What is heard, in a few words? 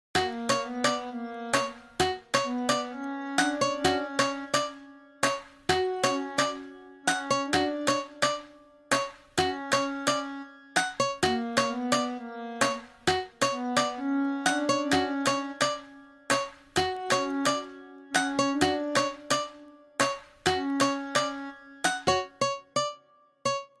Funk Beat